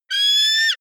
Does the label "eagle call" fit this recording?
Yes